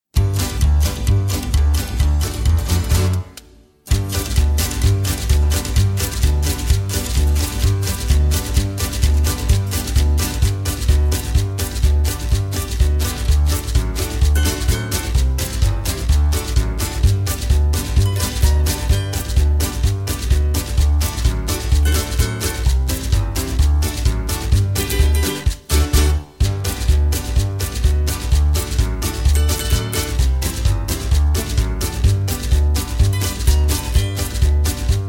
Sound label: (G) No Backing Vocals